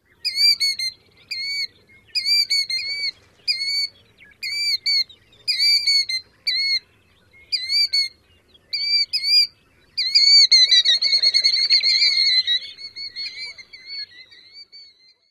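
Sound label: dee, dee-duh-duh and flight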